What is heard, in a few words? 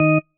marimba tissue; ゆめ2っき